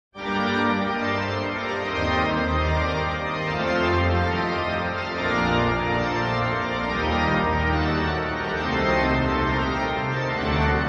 lichen basilica largest pipe organ and church in poland; pipe organ at the Basilica of Our Lady o; Jonathan Scott playing the pipe organ at the Basilica of Our Lady o